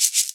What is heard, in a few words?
MIXED PERC; 90 s Sample CDs